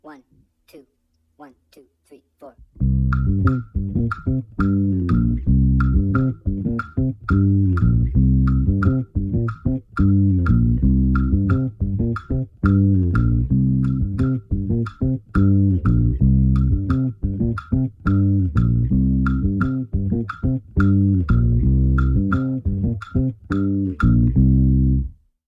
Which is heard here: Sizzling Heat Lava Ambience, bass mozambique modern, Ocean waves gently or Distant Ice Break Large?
bass mozambique modern